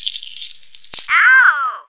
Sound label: inject; Simon the Sorcerer Puzzle Pack (CD Windows); ScummVM